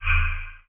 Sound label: text rollover